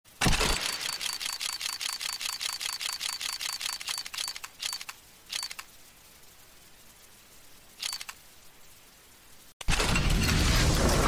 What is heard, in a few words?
roulette